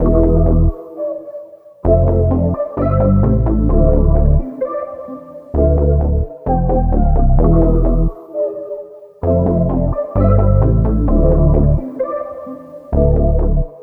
130 BPM A Min, Melodies; OB6+P6

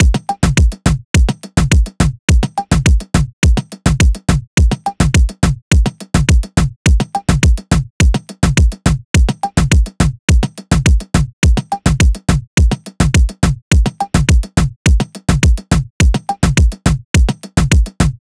Crisp Drum Loop - 105 BPM, Latin

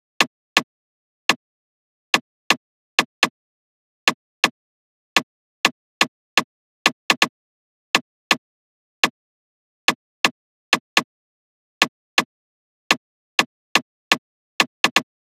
Drum Loop - 124 BPM Percussion Loop; Loop Stems; Cymatics; Anubis Drum Loop 1

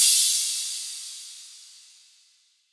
Crash; Cymatics; Humble Hip Hop Sample Pack; Cymbals

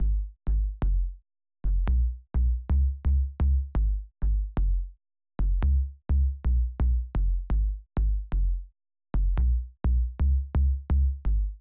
128 BPM G# Min Bass; Loop Stems; Cymatics; Breakdown Loops; Fuse

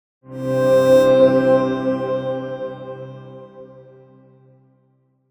Tone

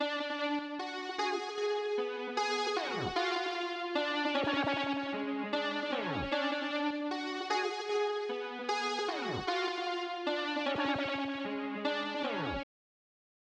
152 BPM F# Min Keys, Hip Hop; Melody Loops; Loop Stems; Cymatics

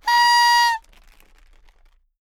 party whistle; Cartoon - film effects

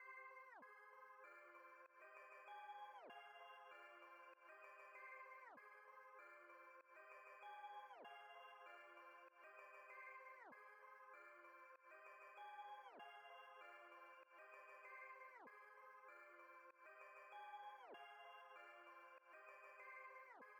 West Coast Melody Loop - 97 BPM C Min Bells; Melodies; Loop Stems; Cymatics; Oracle Sample Pack

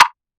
Closed Up Perc; House; Drum One Shots; Percussion

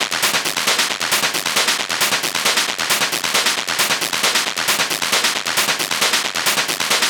Perc Loop 135, Techno

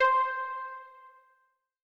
PLUCK (C); One Shots; Orchid; Starlight

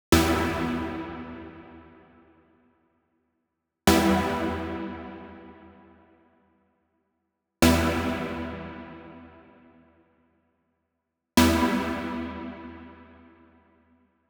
128 BPM E Min Chord Stab; House; Drop Loops; Loop Stems; Cymatics; Loud

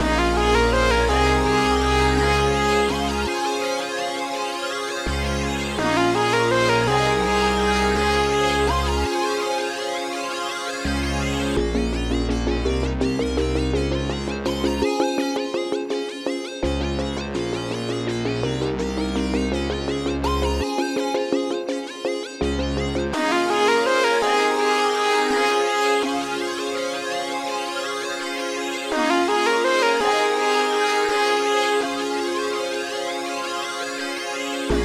166 BPM G# Maj; Foreign; Wavy

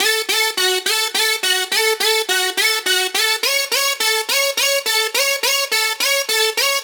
Riddim Melodic Loop F# 140 Waltz Synth; Ghosthack; Riddim Essentials Volume 2

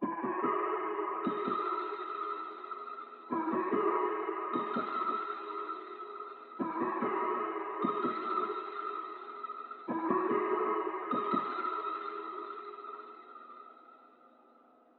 146 BPM C# Min Pluck; Loop Stems; Cymatics; Message

